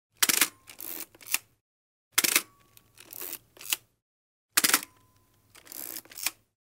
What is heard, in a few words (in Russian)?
zvuk camera